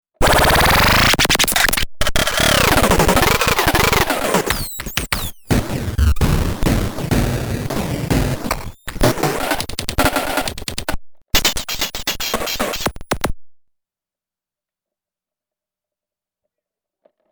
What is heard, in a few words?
electrorap